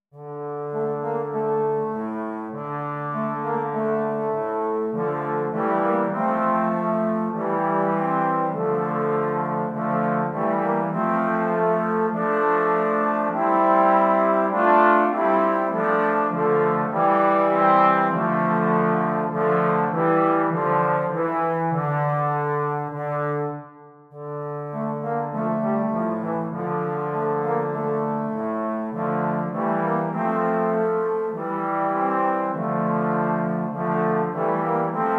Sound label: tromb trio, trombone trio